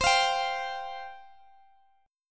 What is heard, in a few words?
C Suspended 4th C, Guitar, DADGAD